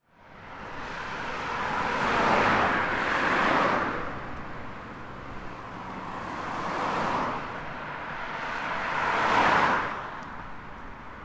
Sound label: Light traffic